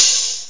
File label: Open hiht; Groovy Bytes: Behind the Moon; BAS PERC